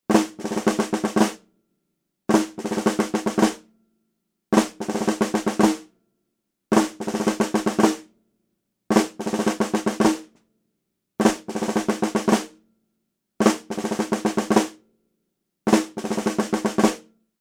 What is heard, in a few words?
Shell Snare cadence